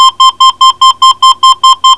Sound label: beep, alert